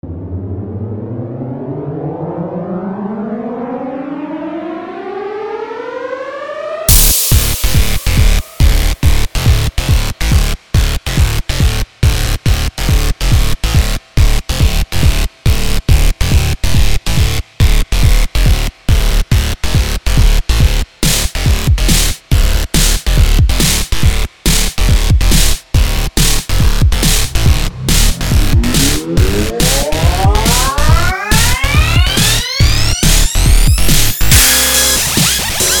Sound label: hyper dubstep